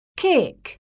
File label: KICK